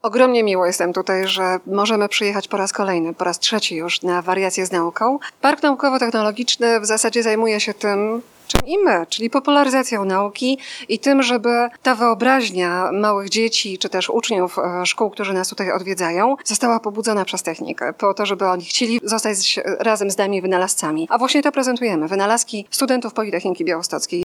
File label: techno